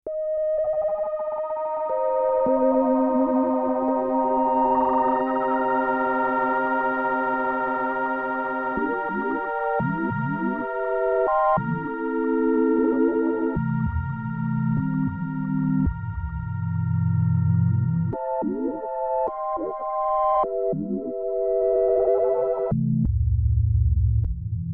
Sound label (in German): komplex pad